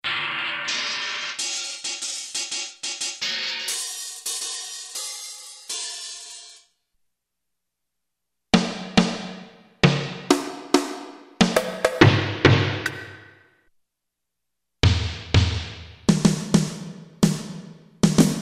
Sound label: Voices, Yamaha S5502 Drums